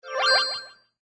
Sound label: Classic game level up